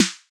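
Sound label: snappy drum and bass snare; dnb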